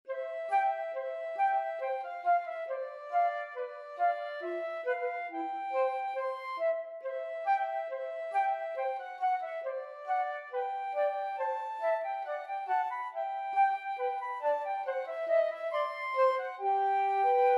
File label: schubert rose, flute trio